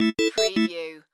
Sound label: Casino Slot Machine Organ